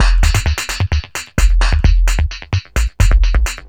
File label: Beats, Industrial